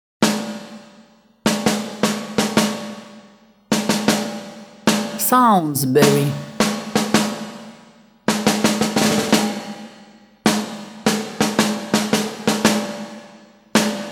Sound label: Anticipation Menace